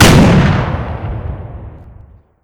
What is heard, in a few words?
explode, weapons